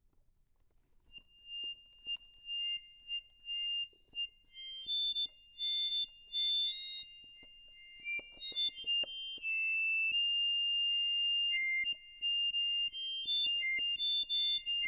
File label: accordion, MONO